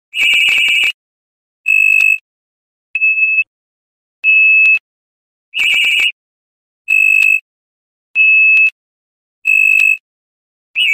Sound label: Alarm Iphone